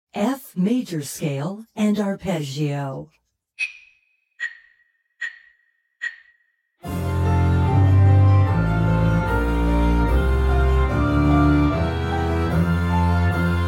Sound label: F Major Scale and Arp